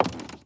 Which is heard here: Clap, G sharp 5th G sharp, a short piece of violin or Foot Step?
Foot Step